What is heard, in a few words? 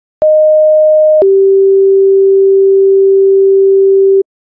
siren; clinton